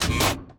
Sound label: Manhole Open